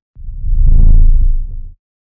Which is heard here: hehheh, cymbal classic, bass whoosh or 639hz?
bass whoosh